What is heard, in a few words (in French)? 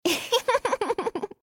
fou rire